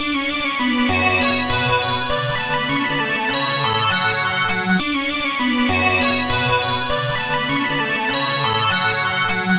Digital Synth; Sonic Foundry (Sony Creative Software); Club Joints and Street Anthems

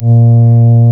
REED A#; Big Fish Audio; Synclav FM

